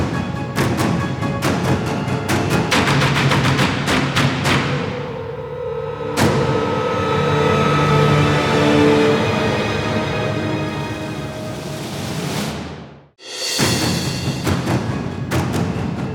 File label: Music; Scene12 Choice A; Warframe; Cinematics; War Within